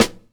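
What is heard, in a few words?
full snare drum sample a key, tuned to the A note; snare-drum-samples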